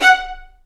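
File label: Vio spiccato F#4; Digital Sound Factory Virtuoso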